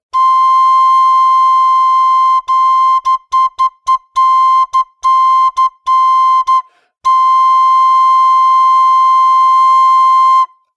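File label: C American Flute high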